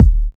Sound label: studio kickdrum sample D# key